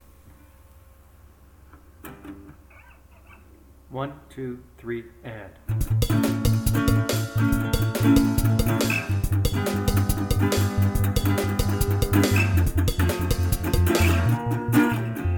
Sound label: another one w drums